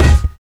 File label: physics cube md; Portal 2